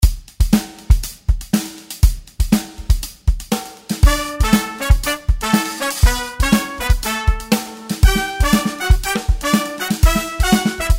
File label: Session Horns; NI